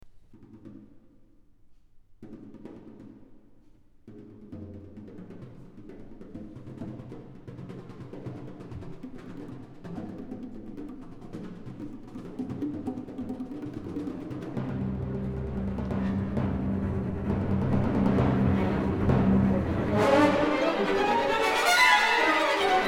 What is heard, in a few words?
Violin Concerto, Perpetuum mobile. Agitato ed allucinante